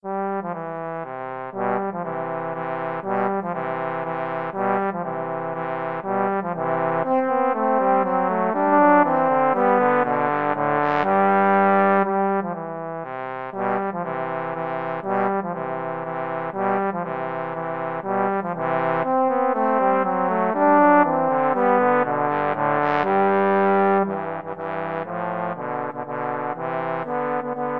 trombone duet

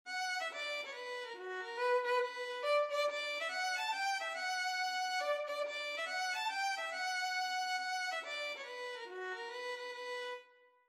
rambling boy, violin

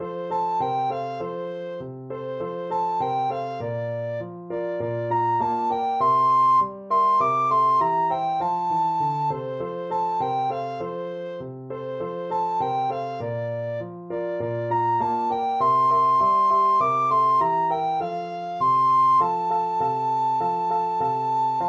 jingle bells, recorder

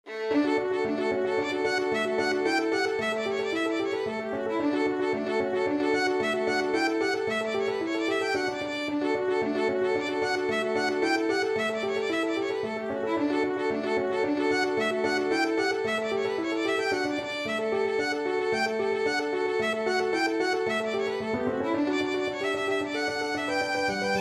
Hornpipe violin; CIncinatti

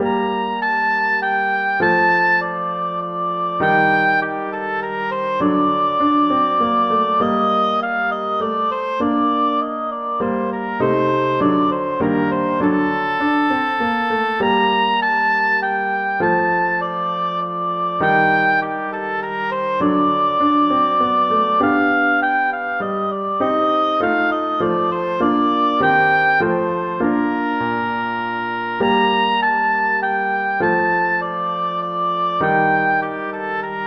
bach minuet in g minor bwv anh 115, oboe

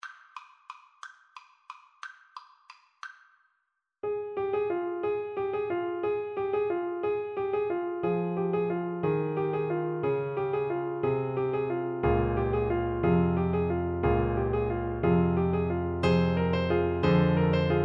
Baritone Saxophone

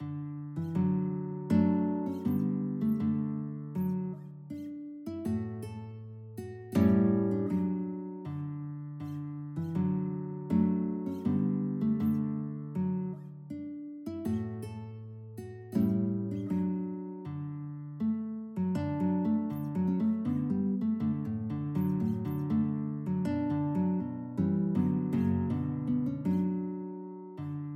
last rose, guitar

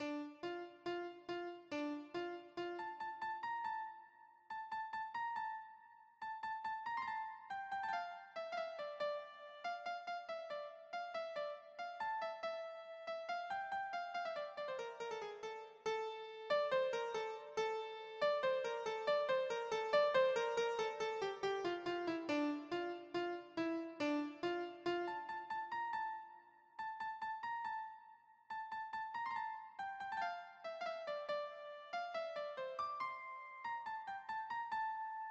barber seville overture, keyboard

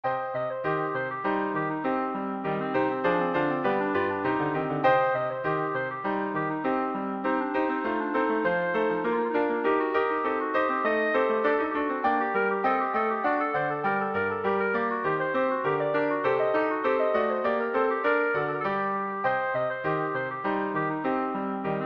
badinerie, clarinet; bach

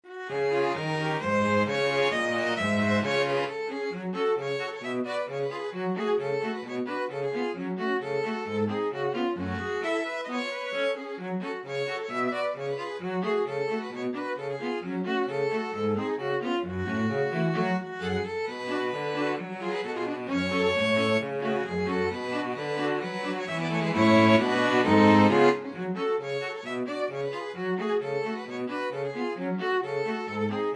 string quartet; abigezint

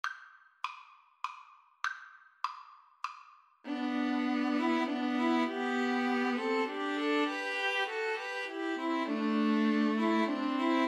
String trio

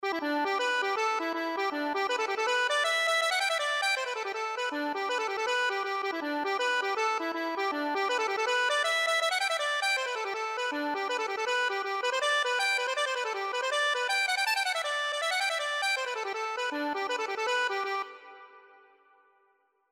accordion

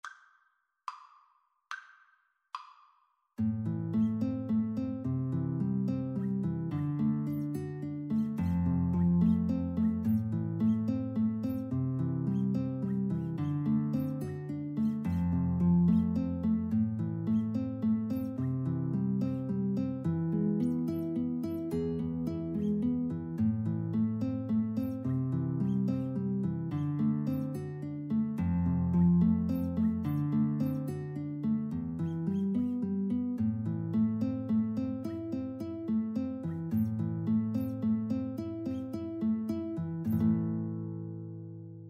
house of the rising sun, Alto Sax Guitar Duet

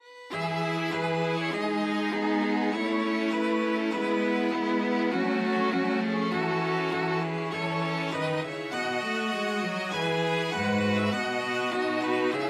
national anthem russia, string quartet

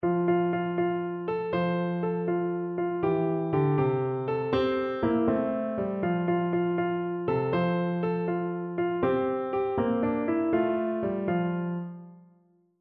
mulberry bush, piano

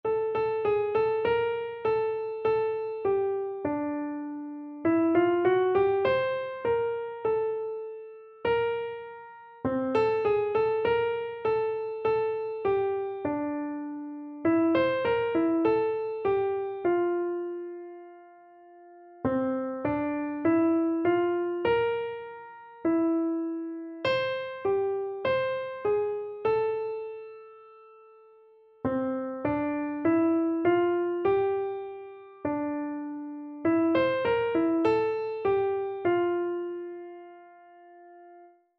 keeps me singing, keyboard

